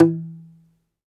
DOMRA, Segundo Domra, Plucked Strings; E-MU Producer Series Vol. 7 – Old World Instruments

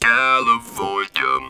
TB CALI, Talk Box

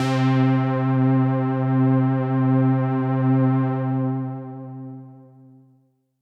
Pad C, Synth Prophet One Shots; Electronisounds

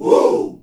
GROUP WOO, SHOUT; Spectrasonics; Heart of Africa 1; CH. SHOUT1

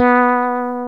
BASS E4; AKAI S6000 CD-ROM - Ultimate

